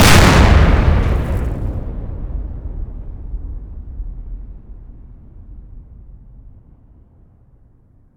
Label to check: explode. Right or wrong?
Right